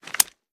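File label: pistol reload mag release; gmod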